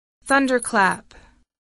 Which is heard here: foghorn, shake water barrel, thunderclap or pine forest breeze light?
thunderclap